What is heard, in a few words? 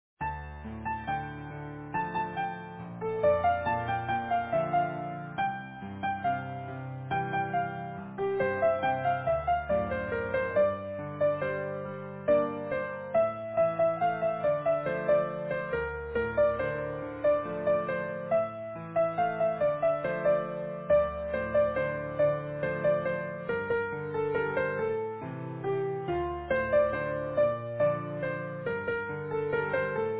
easy piano